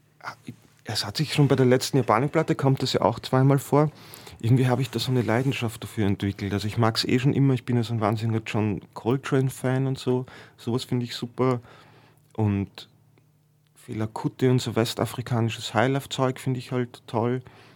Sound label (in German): Saxophon